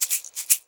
MULTI EGGS; Best Service; World Colours

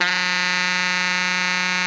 TENOR GROWL F#3, Saxophones; Greytsounds; Philip Wolfe David Crozier's Saxophones

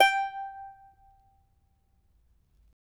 charango G4; Spectrasonics; Hans Zimmer Guitars VOL-2

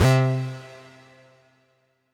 Synth C, Synth Prophet One Shots; Electronisounds

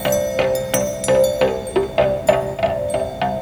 Dub Drone; Electronic Point Blank

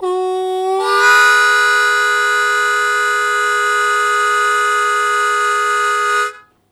Blues Chord Attack E1, Harmonica; Zero G

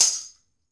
toy tamb

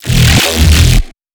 Neuro, bass

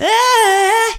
aayeh, female vocals; PlatinumPhattCD2; E-MU Producer Series Vol. 8 – Platinum Phatt

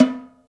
St Snare Wires Off, Roland BK-5; Snares

